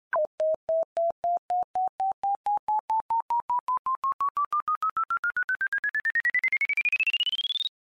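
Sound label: energy transfer sound effect accelerated data transfer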